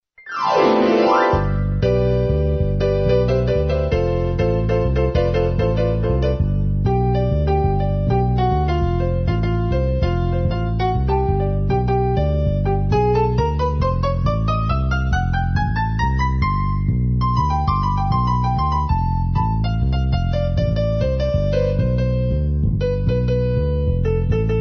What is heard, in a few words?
Piano Improvisation